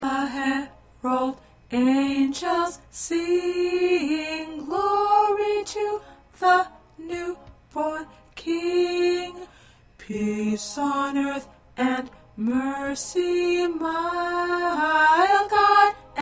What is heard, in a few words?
Soprano, choir